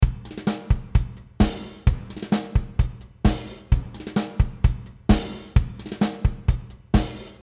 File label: Drum Heavy Drums